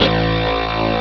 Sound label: d50 bass guitar; ST-XX Amiga Soundtracker Sample Packs